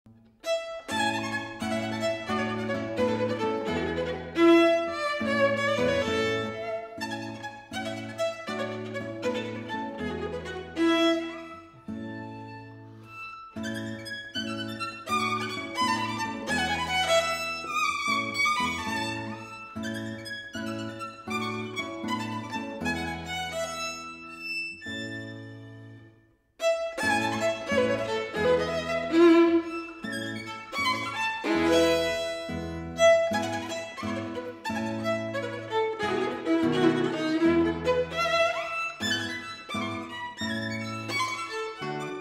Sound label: La Carmagnola (Var 10); Niccolo Paganini